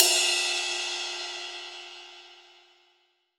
RIDE, MONO RIDE CYMBAL

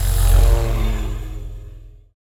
COPTER; E-MU Producer Series Vol. 5; 3-D Audio Collection; 3D Multis; 3D Sprint Select 2

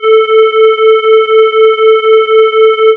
SWIRL; X-Static Goldmine 1

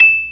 B3 PERC E6, Breathy Organ; E-MU Producer Series Vol. 2 – More Studio Essentials

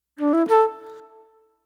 Soul Plate Flute; Sonic Foundry (Sony Creative Software); Manhattan Flutes; Session 03 - Classic Caddy

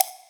Wood, Block Stick; Equipped Music; Loungin House

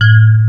Electric Pianos; Wizoo Electric Pianos

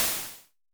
Crash Misty, Vermona Mono15 Drums

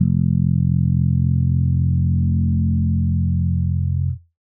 Dusty Analog Bass F, Bass Guitar; 99Sounds; Monosounds; Music Loops; Instrument Oneshots

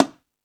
Latin Drums, E-MU Pro-Cussion; drum machine

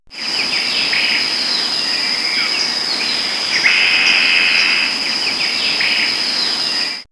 Nature, In D Jungle; X-Static Goldmine

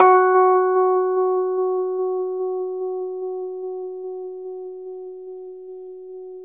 Chor Rhodes, F#; E-MU Producer Series Vol. 5 – 3-D Audio Collection; 3DRhodes; 3DChorRhodes1.5

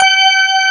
CX3 C6, hammonds; East West; Global Sounds

